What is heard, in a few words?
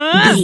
voice female attack